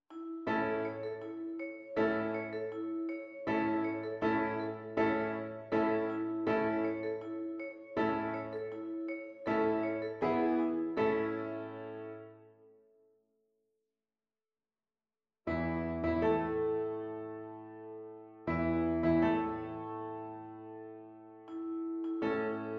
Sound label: Bugle Calls